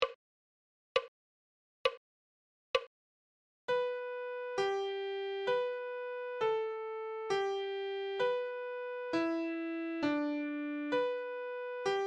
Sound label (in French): clé de sol adagio